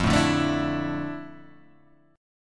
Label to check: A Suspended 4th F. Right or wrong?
Right